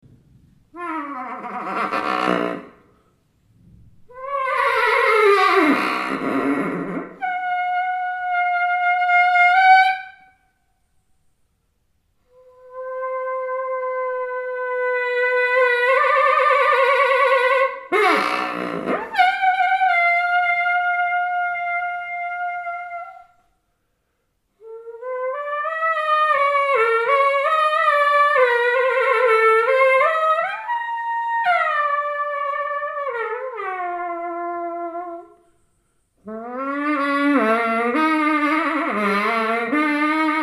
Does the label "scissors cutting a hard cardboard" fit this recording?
No